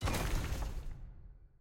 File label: ui map vfx construction